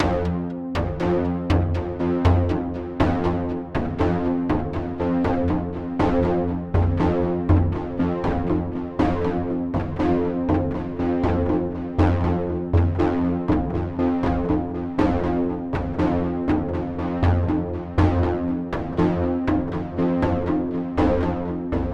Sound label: Background Beat In F maj